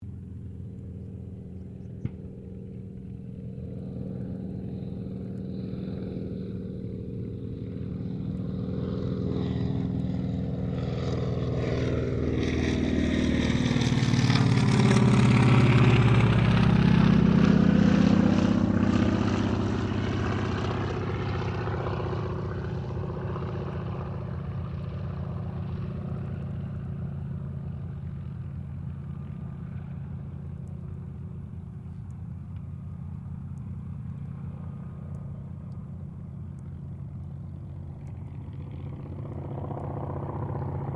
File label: AIRCRAFT CAC WIRRAWAY PASS; CA-16 Wirraway; Airplanes; Vehicles